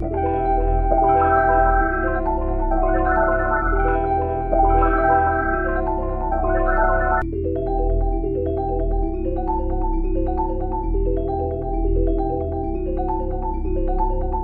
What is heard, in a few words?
Bells 133 BPM E Min; Cymatics; 2022 Melody Collection Platinum Expansion